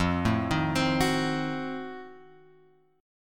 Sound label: F 7th Flat 9th F; F7b9 Chord {1 0 1 X 1 2} arpeggiated